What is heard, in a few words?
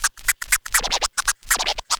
FX scratch; Club-50 - Foundations Roland; Scratch 1 S